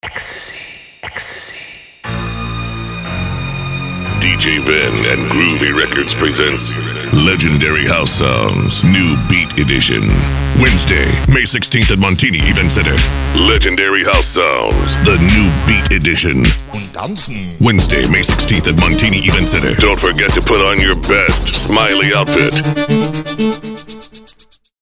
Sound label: house sounds new beat teaser; legendary